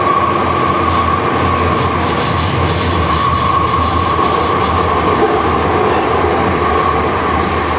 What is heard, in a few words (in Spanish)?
paso Curva Cerrada; TMB-S9000; OpenBVE; Train